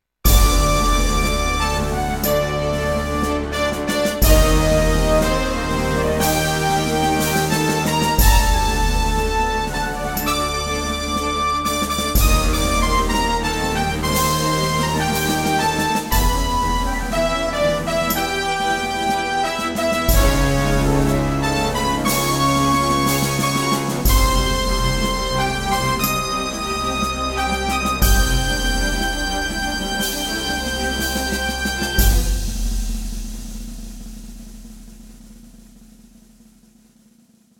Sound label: Orchestra And Timpani; Combi